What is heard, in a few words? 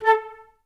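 flute staccato A3; Best Service; Peter Siedlaczek's Advanced Orchestra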